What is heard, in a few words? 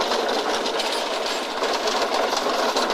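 chariot wheel mechanical Speed; Puddle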